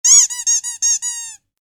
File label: Squeak, Squeezy Dog Toy; Miscellaneous Toy Sound Effects; Miscellaneous Toy (Sound Effects:Toys); Squeaky toy; Stuart Duffield